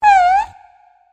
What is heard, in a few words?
connect; monstergo; pokebot